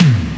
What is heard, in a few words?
PERC FX, SYNTH PER; AKAI S6000 CD-ROM - Volume 1; PERCUSSION